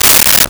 Turn Page of Hard Cover Book; Office Supplies

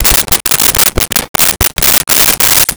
Write on Cardboard with Marker; Office Supplies; 1001 Sound Effects

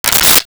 Gadget, Mechanical

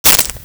Gadget, Motors & Switches; 1001 Sound Effects

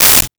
Hydraulics, Mechanical

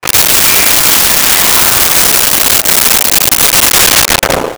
Missile Fly By, Weapons; 1001 Sound Effects

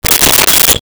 Candy Dish Lid; Kitchen; 1001 Sound Effects

